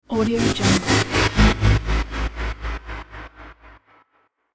cinematic pulsating whoosh

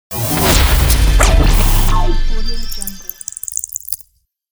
short glitch logo

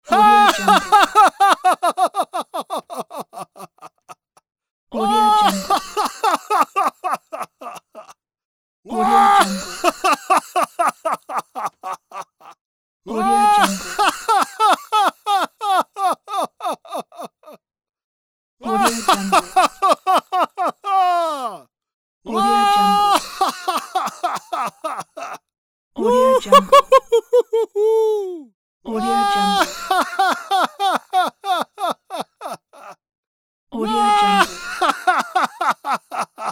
loud joy man laugh pack

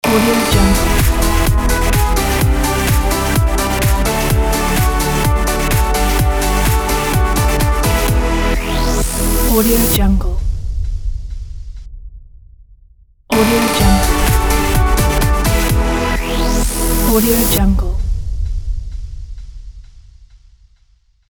dynamic jingle

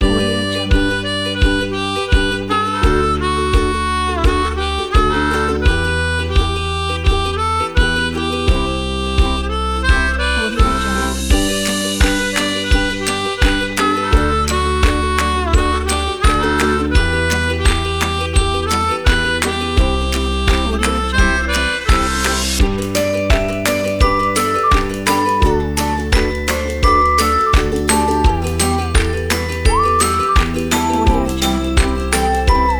harmonica with happy ukulele

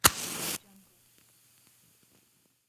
lighting a match

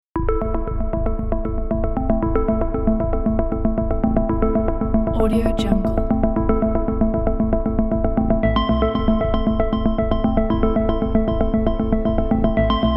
mystical electronic quest

